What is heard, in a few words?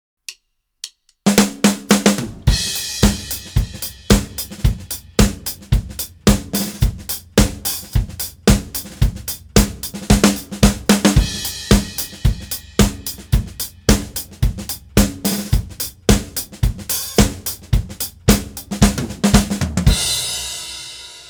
Four on the Floor